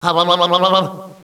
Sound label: huh blblblblbl, Echo inside caves, underground; DS; ML BOWSERS INSIDE; Luigi